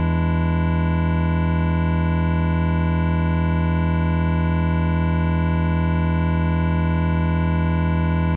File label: e7 chord, Omnichord; OmnichordGodot